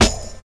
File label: clean snare drum a key, Royality free snare single hit tuned to the A note. Loudest frequency: 2810Hz